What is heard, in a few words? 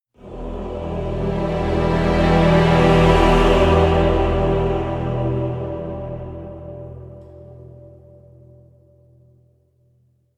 epic growth e minor